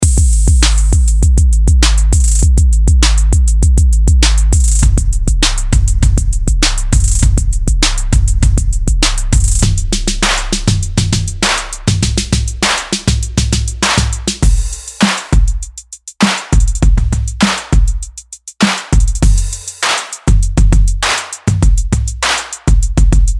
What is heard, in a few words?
Urban Drumsynth; MASCHINE 2.0